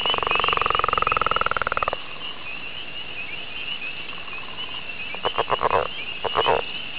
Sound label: Leopard Frog